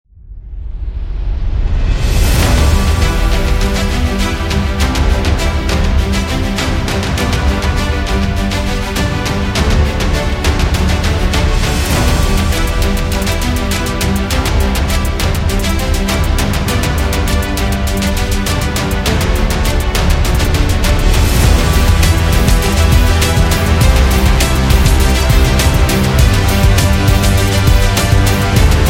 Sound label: Epic Hip Hop Sports; epic sport metal